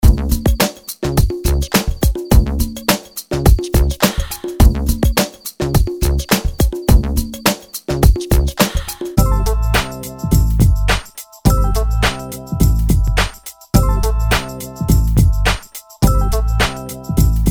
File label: Loops Instruments; PSP